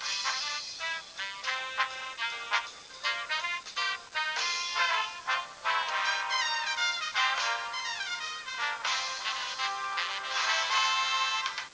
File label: radio jazzy